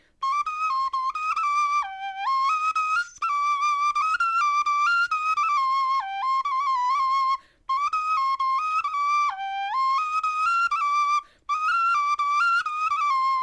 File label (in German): melodie, knochenfloeten